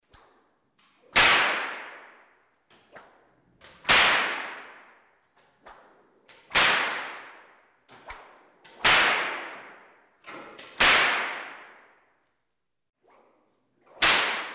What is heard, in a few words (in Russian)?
udar pletju